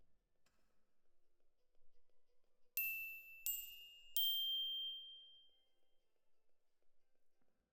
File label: Glock Close, Jazz for piano